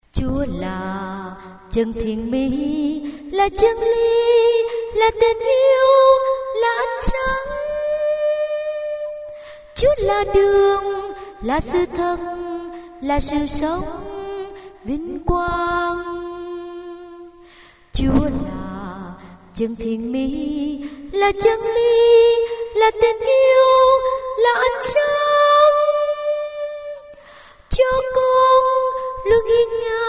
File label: sop alto